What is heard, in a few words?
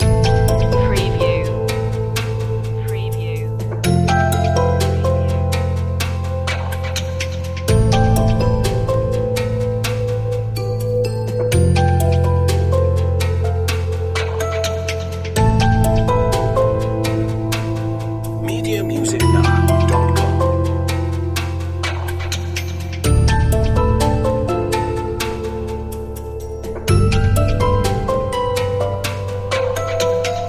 a glow loop